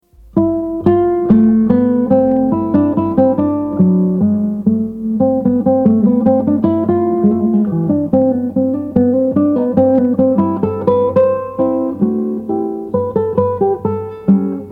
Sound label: Lute Suite